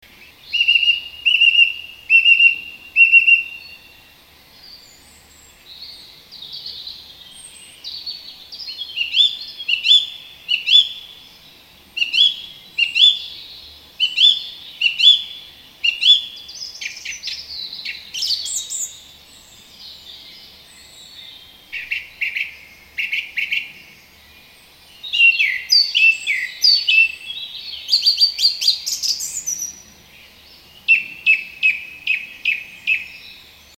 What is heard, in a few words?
turdus philomelos, vogel; hecke; biologie